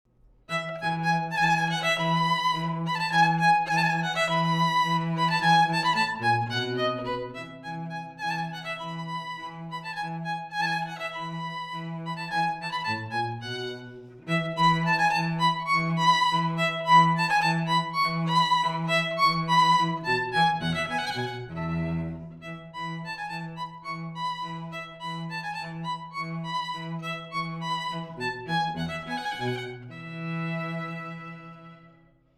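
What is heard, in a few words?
duo violon cello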